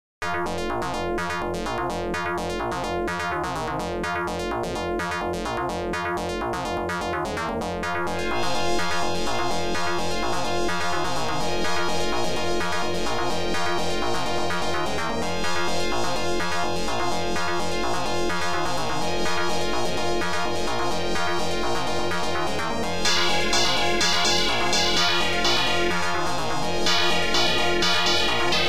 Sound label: OPL2; Inside Building; Body Blows; Yamaha OPL2